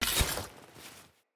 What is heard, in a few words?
Sphere Polearm Ground Finisher